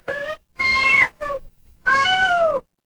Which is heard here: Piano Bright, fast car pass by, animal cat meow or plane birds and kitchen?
animal cat meow